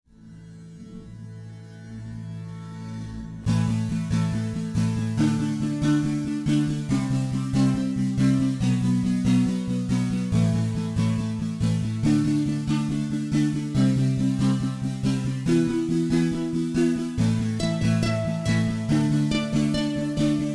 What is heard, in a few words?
Folk Rock